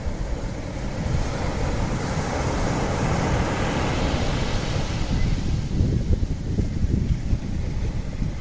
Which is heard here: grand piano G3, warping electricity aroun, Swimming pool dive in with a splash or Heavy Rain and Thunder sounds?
Heavy Rain and Thunder sounds